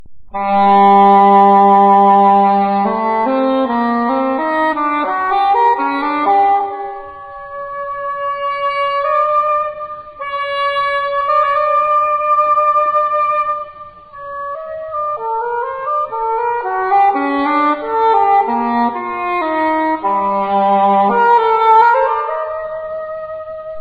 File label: english horn; INSTRUMENTOS MUSICAIS